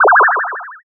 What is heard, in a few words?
teleport